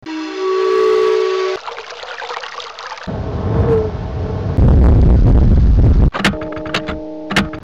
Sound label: Door Play; Myst